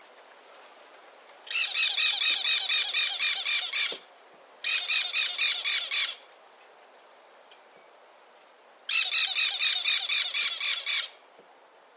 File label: aratinga cactorum